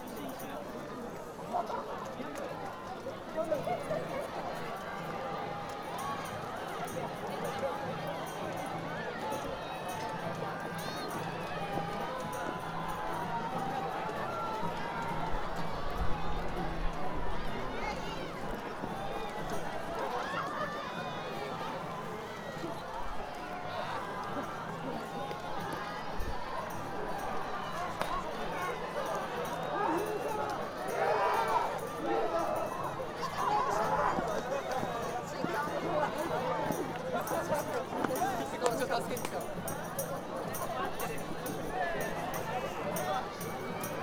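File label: after commencement ceremony, Fukushima University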